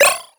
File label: Retro PickUp; FreeSFX; GameSFX